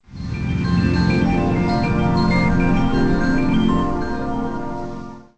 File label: Windows 98 Slim Shutdown; Windows Never Released Soundpack 2